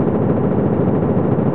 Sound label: bus eng; quake2; coop